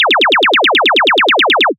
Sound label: bullet laser